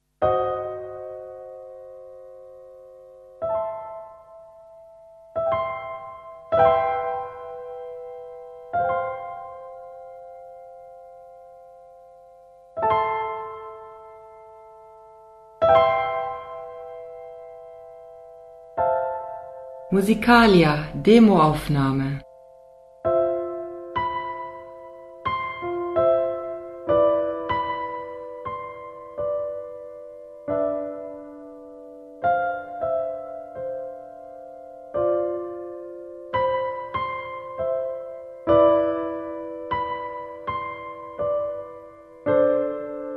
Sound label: piano solo